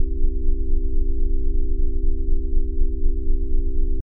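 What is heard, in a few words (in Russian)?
орган аккорда до мажор C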